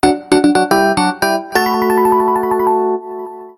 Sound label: Jingle Happy Small